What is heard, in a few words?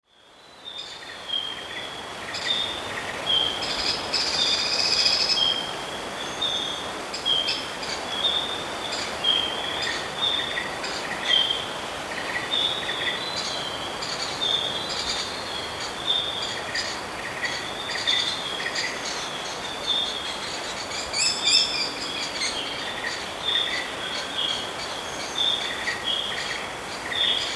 grey capped woodpecker